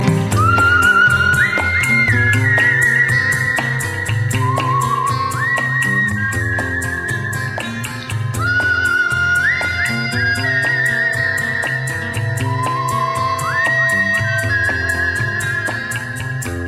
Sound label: classical whistle